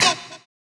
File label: Fx Vox; Equipped Music; Loungin House